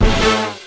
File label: mp death; Army Men TiS